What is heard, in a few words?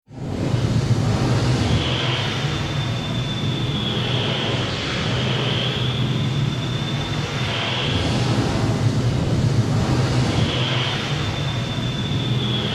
Industrial Ambience Tonal Warehouse Mechanical Steam Exhaust Metallic; Factory; Backgrounds; Factories (Sound Effects:Backgrounds)